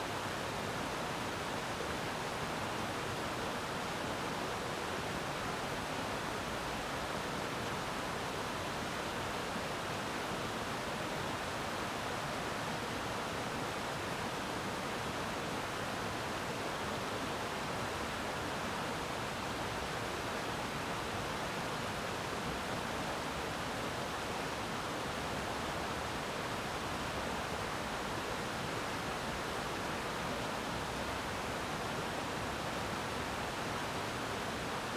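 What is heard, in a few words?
ambience China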